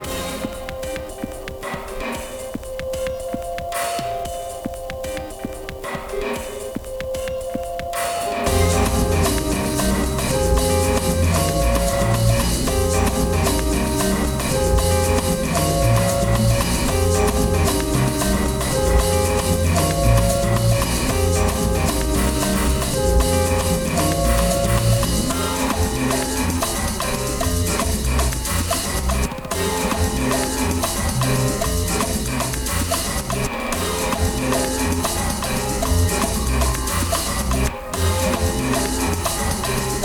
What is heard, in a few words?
Sample Breaks